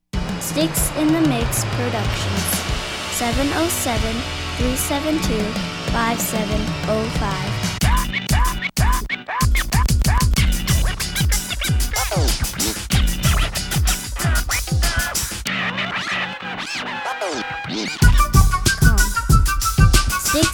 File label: timbo flutes